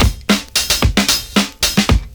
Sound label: 70s Breakbeats; USB Soundscan vol.46; AKAI